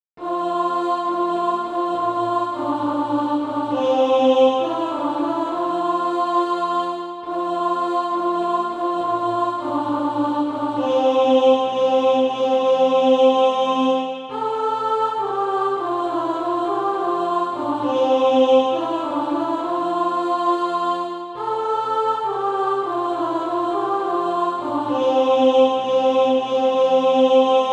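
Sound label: alto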